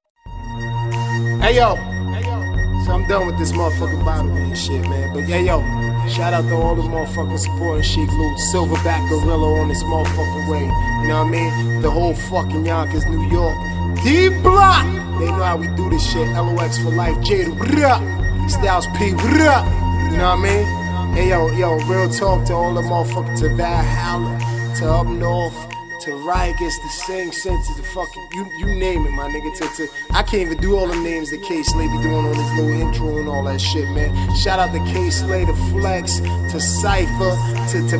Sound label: Howling Outro; The Howling